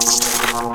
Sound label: attack